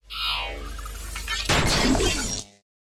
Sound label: Final Boss Second Phase Swap; enemies